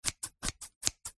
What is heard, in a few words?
office scissors 3x snip